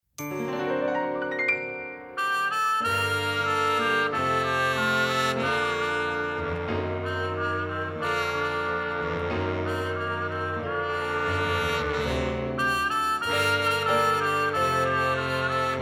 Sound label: Karaoke